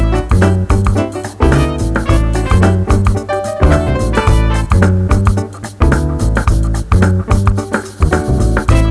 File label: groove